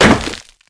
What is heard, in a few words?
pumpkin action breakthrough, weapons